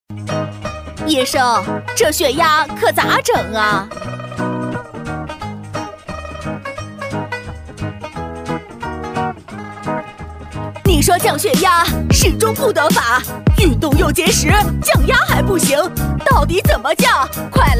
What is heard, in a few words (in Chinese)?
女-说唱Rap 大妈版-高血压